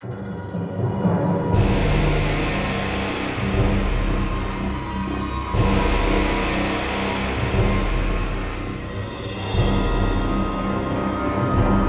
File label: Action High Intensity; Star Wars The Old Republic Rise of the Hutt Cartels and Shadow of Revan